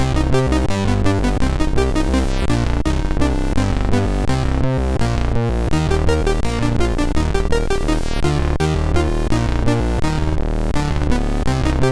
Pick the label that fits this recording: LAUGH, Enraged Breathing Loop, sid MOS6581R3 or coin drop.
sid MOS6581R3